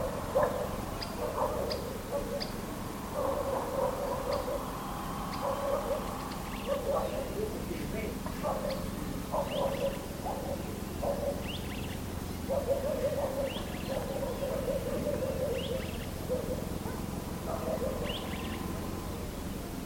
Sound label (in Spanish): Benteveo Rayado